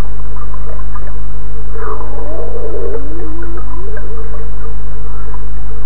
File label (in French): Son Poisson, Sein Acoustic; projet-seinacoustic; Biodiversite